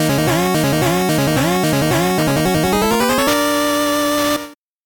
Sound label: 8bit jingle fast